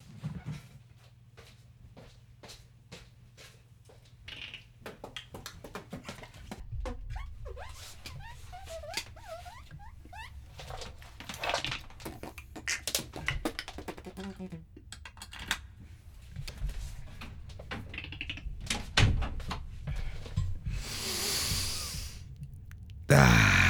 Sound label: beatbox interlude